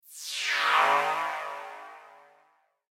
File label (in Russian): kosmicheskaya smska klassnyy rington sms